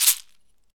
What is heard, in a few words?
SHEKE, Afro Shakers, Shekeres